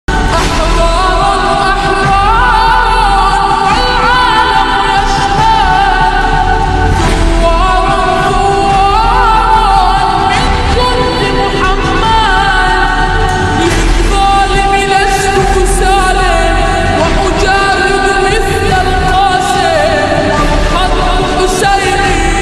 Islamic bgm ringtone naat ringtone

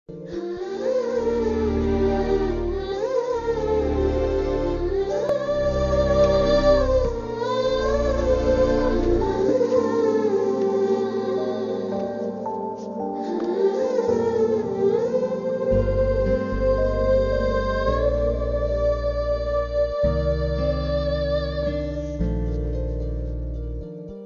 emotional love bgm ringtone; sammathame; kiran abbavaram

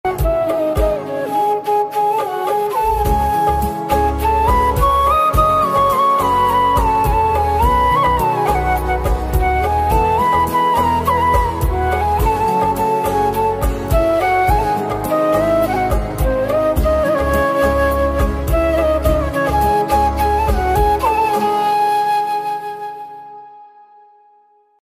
flute ringtone, instrumental ringtone

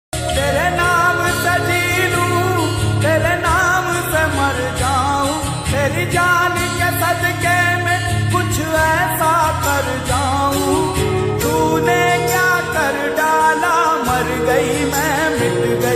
slowed reverb lofi

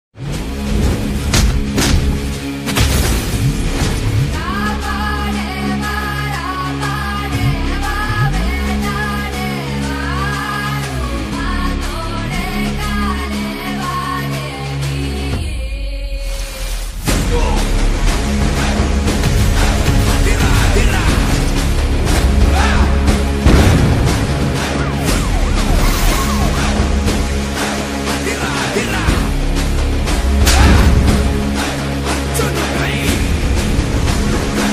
thriller bgm; arjuna phalguna

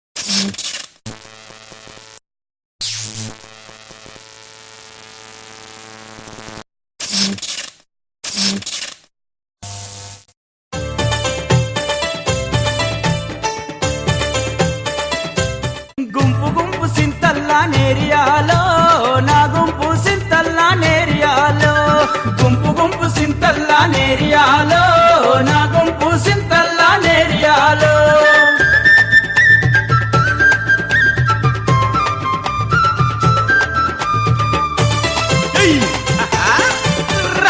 Telugu Folk Video Song

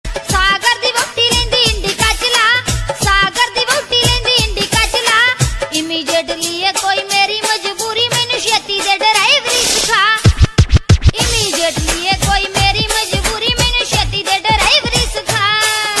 punjabi song ringtone

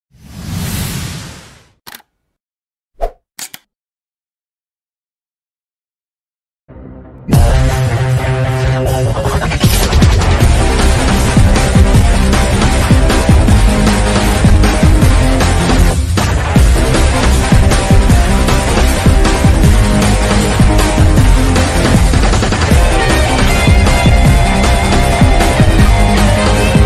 mass bgm ringtone gun shoot bgm; thuppaki movie

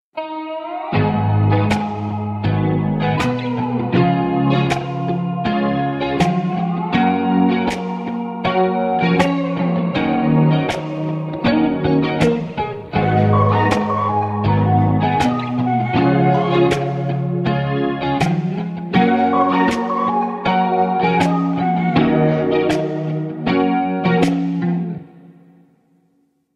ringtone instrumental

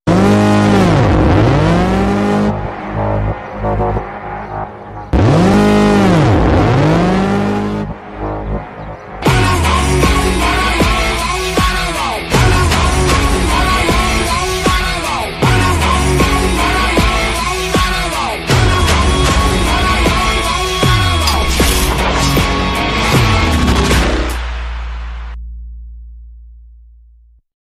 attitude stylish instrumental; bgm; bad boy ringtone; tamil; aaditya varma

